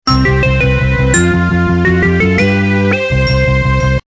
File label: Loops - Triumph; Scratch